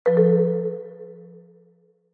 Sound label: notify